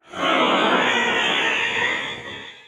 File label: ship engines; World Of Warships